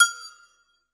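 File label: AGOGO HI, Drum Machines; EdgeSounds; Drum Mashines VOL-1; X-DRUMS KIT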